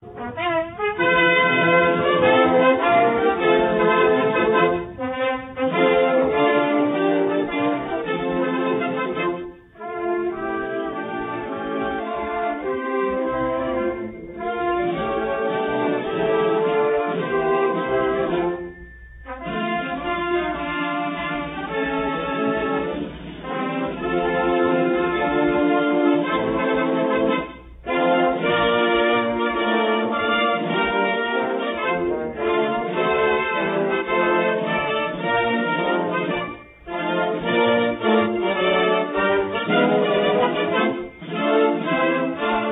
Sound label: South Vietnamese national anthem on radio; AFVN radio